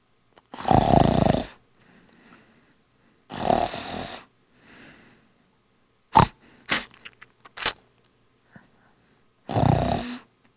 Snoring, people

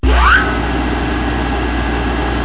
Conveyor belt

